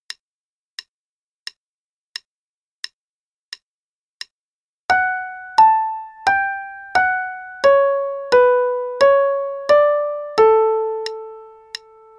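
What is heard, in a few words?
Play-a-long, right hand, quarter note=88; Satie; Gymnopedie 1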